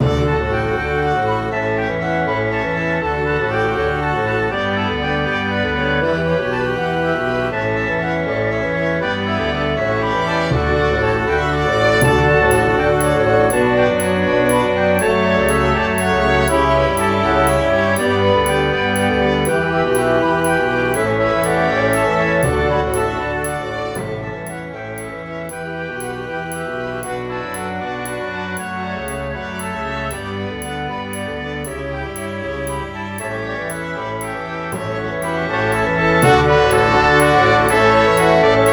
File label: processional